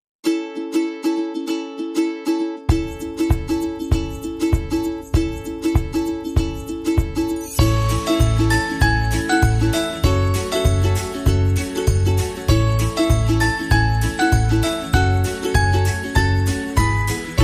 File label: Retro Drums